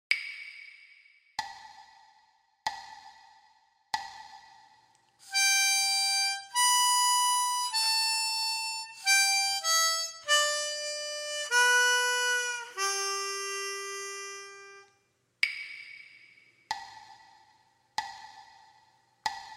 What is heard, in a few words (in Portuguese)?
gaita blues lick; gaita diatônica